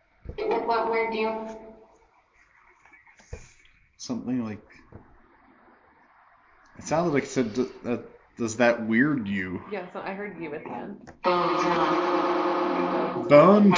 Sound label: Chessie Room Phasma Box